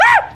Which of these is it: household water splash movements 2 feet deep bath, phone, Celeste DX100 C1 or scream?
scream